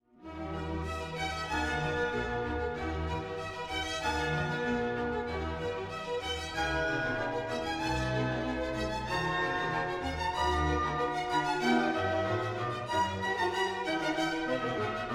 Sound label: Orch coda; K427 Quoniam